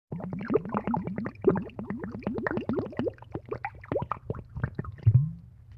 Water Pour Glugs; Vintage Cartoon; Foley

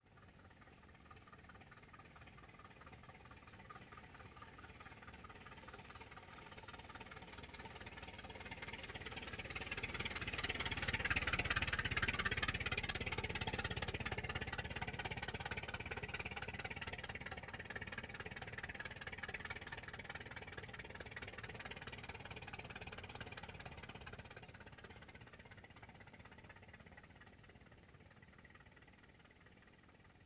Ford Model T Slow By Long Out; Period Vehicles

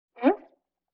Violin Short Pitch Slide; Vintage Cartoon; Musical Elements

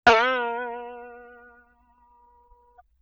Boing Box High; Vintage Cartoon; Hits & Tones

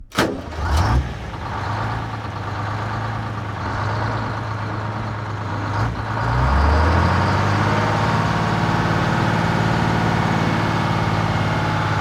1948 Diesel Truck Ext Start Idle Reverse Idle Off; Period Vehicles

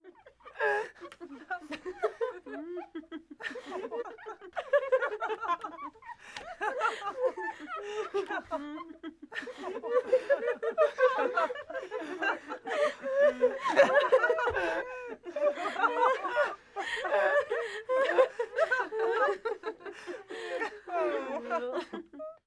Laughing Small Female Crowd; Vocals & Wallas

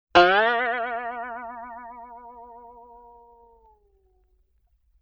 Boing Box High

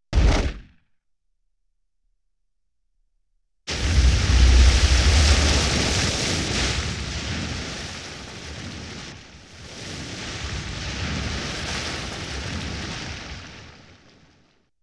Explosion; Explosions - 5.1 Surround

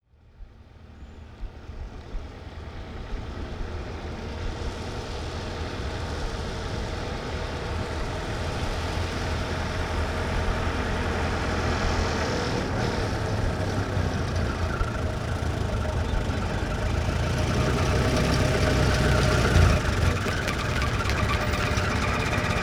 1924 Ford Stake Bed Truck Ext In Fast Idle Out; Period Vehicles

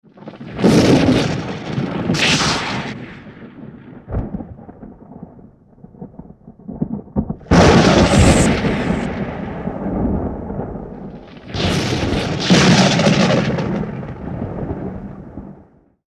Sci-Fi Lightning Storm; Sound Design

